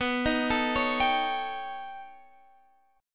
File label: B Augmented 9th B Guitar Drop D